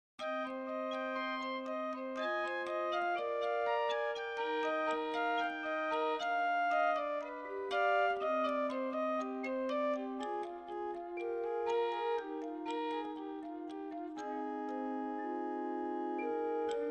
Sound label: Organetto